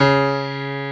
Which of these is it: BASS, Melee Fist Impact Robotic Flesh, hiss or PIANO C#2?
PIANO C#2